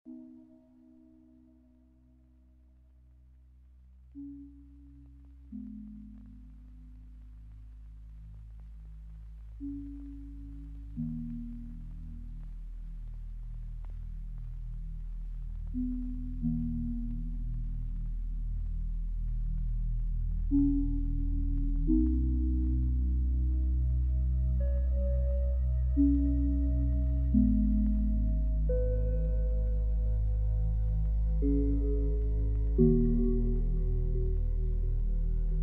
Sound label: dark ambient sad music